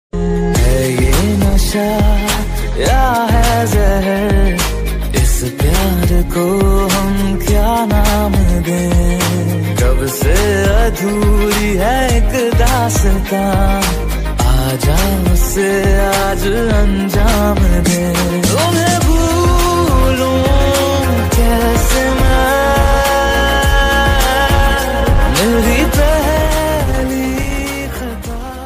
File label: Romantic Hindi Song Ringtone